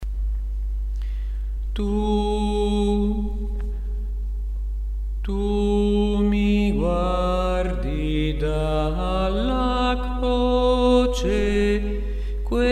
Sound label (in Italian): tenori